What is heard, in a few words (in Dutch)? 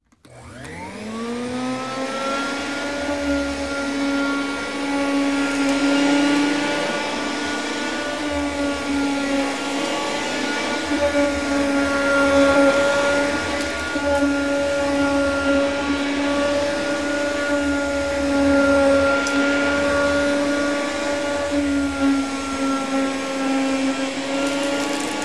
Stofzuiger; KMP Film Video Sound Effects